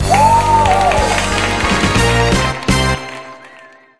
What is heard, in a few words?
Correct; Dinox; Wii U